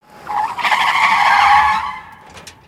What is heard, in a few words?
Skid Stutter; vehicles